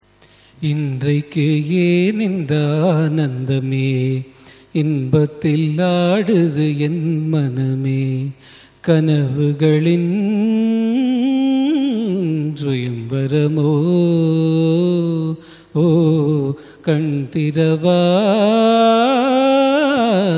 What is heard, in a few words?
raga abhogi cine